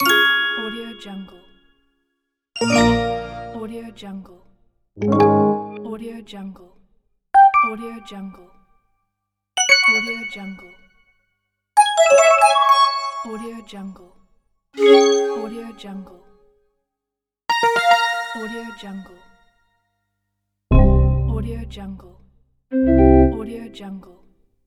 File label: button click ui effects